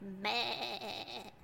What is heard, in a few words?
goat